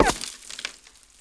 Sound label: wall; Mafie 1